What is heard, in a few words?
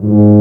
Tuba mf G#1; Digital Sound Factory Virtuoso